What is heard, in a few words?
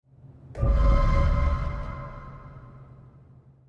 Detected; Far Cry 5